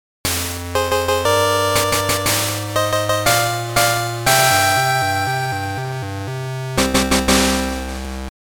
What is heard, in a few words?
Versus Win; tetris 2 1993 gb